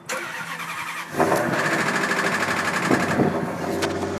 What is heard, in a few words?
maserati cold start